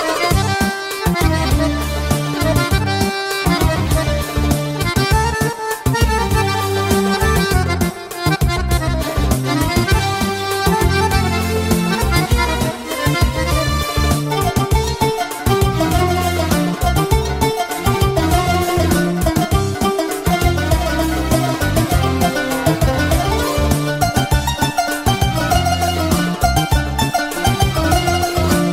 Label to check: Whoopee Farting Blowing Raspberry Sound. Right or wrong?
Wrong